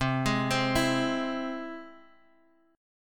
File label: E Augmented C, guitar